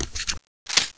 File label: fiveseven clip in